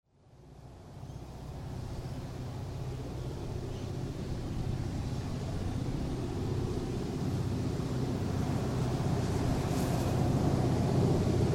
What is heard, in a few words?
diesels